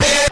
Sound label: "hey", SUNG